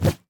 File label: Flying Insects, flap